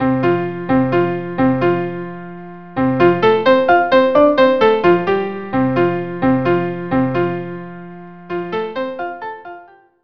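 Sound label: Hunters Song violin piano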